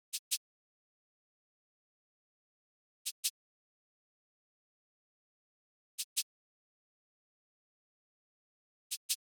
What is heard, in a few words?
Drum Loop 82 BPM Shaker; Cymatics; Vibes II Drum Loop Collection; Distance Drum Loop